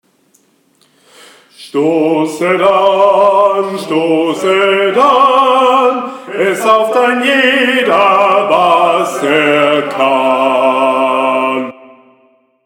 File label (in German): BASS; Gesang; Stosset an